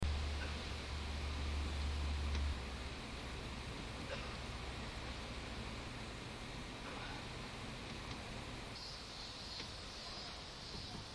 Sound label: rain; x3parking9am; rain-go-home